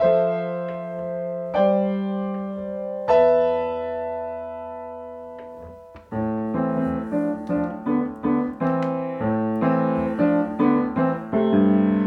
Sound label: piano only